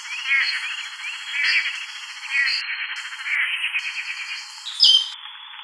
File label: chiroxiphia linearis; bosque seco